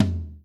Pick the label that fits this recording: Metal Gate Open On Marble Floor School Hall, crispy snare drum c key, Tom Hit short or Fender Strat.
Tom Hit short